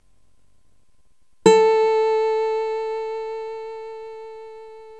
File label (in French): La guitare; Analyse de sons musicaux avec WAVEFFT